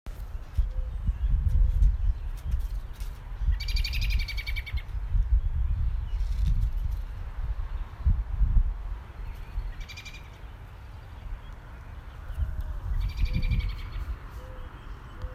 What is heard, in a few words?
american robin